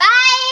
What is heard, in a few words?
lose; skywhale sticker